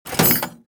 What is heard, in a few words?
Metal drawer closing